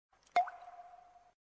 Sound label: Unlock, phone ui; THL W100